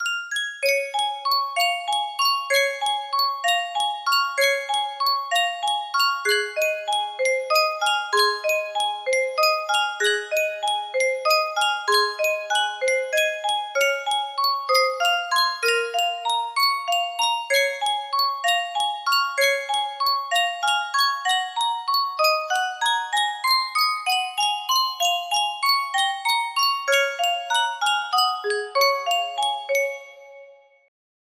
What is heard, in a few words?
yunsheng 30 note music box, music box melody